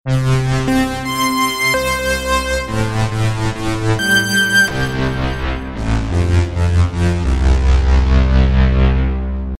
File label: pad sms